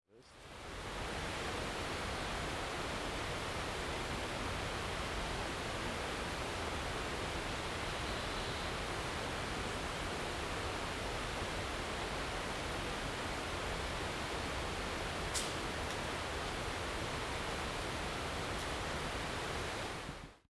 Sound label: Josephine Rainforest